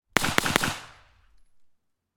beretta 93r 9mm 1m left on ground clean triple shots; dpa4062; fvtt sonniss gdc audio